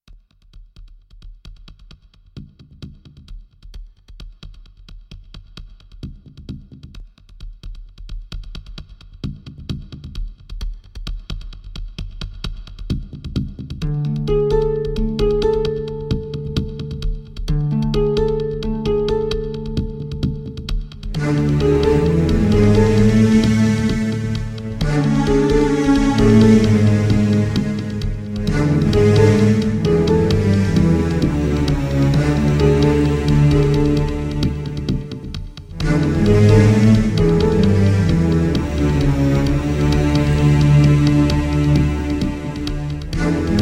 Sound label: Instrumental